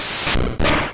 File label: Karate Chop Hit; sfx; attack moves; Pokemon; gen 1; rby